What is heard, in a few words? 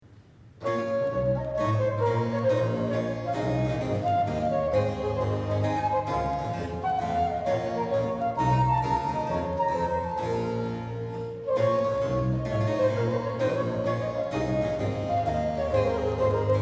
Sonata for flute in b minor - Corrente; Alexander Munro (1796-1773)